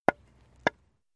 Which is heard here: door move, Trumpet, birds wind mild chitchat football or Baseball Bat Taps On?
Baseball Bat Taps On